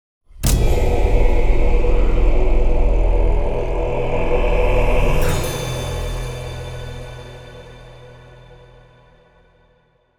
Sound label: Hollow Mouth Open Stinger; kwolok Hollow